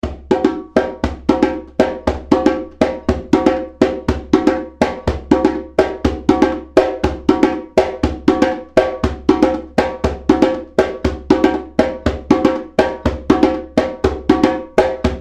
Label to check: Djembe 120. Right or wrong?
Right